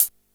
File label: closed hat, Yamaha RY-8